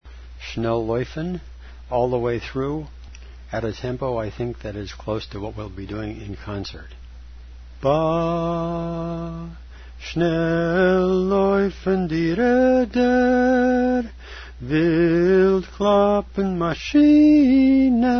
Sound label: basses; Shnel Loyfn; shnel loyfn di reder